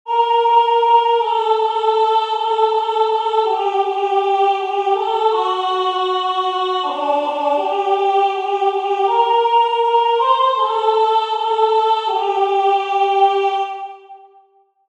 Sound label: Soprano